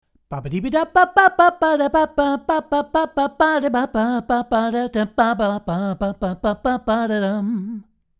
GAP Vox

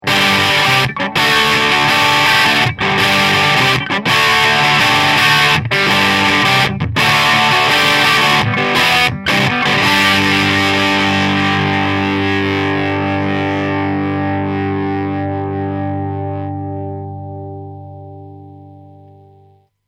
6L6 Crunch

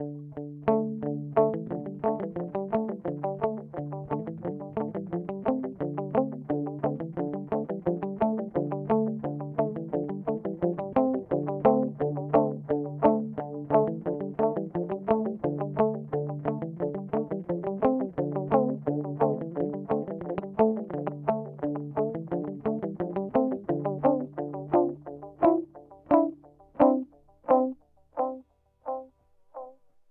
Mooer D7 Tape Delay

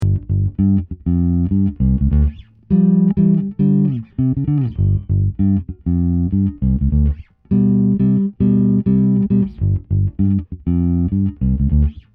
nice warm

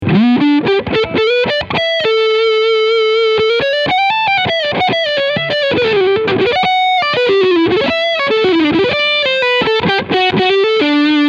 Leadsound Front PU

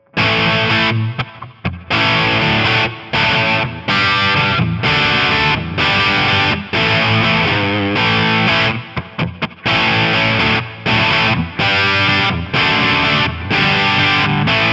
DNAfx Crunch; Quad Cortex vs. DNAfx

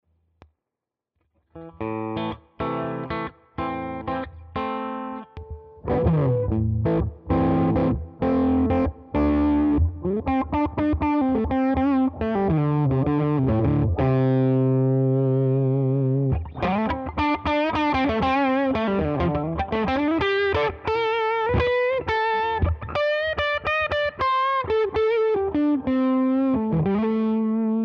Licks LoGain Cutoff

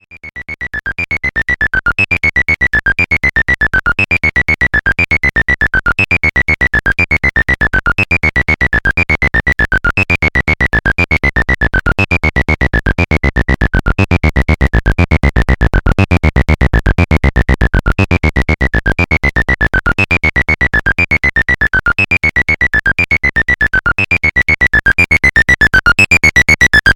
Overdrive

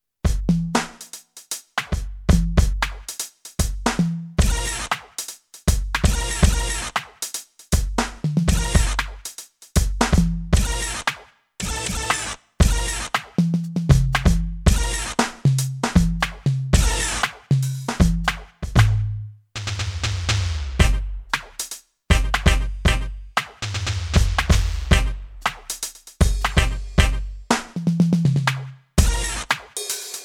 Thomann MPS 850 Kit FX Kit

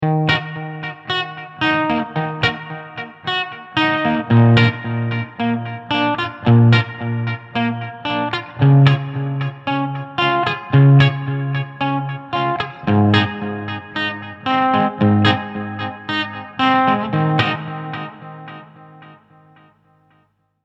Fender Bandmaster clean

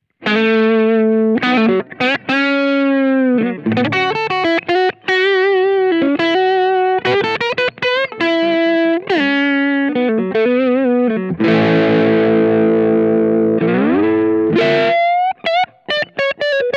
Schecter C 1 Exotic Neck Humbucker Breakup

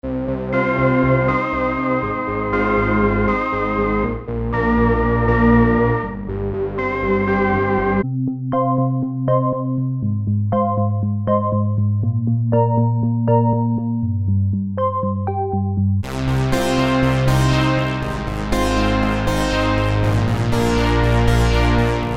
Synths

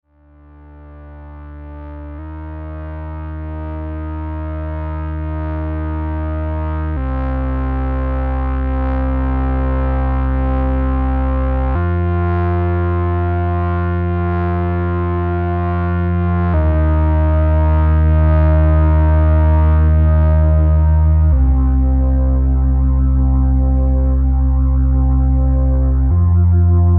Ensemble Generator Slow

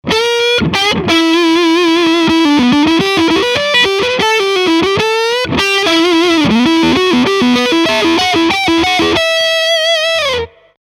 Leadline

